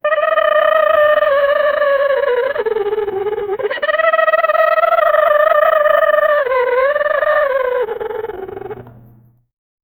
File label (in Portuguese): urso chorando; bear